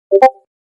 notification; discord

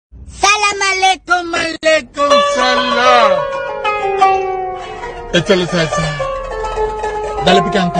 goofy ahh ringtone meme

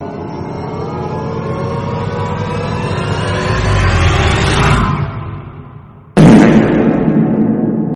fart meme sound better and louder

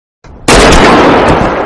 gunshot; squid game

